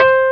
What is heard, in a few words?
EPF C 4; AKAI S-Series CD-ROM Sound Library VOL-2